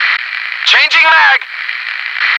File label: noice